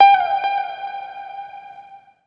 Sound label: plink, Notes, Guitar